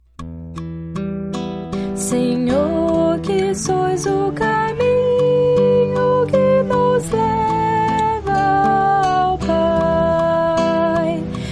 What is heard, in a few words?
soprano